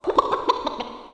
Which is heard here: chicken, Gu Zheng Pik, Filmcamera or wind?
chicken